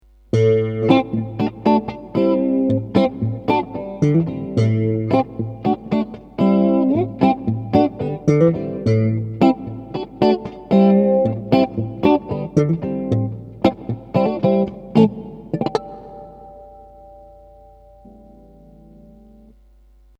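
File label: Clean Neck Bridge, guitar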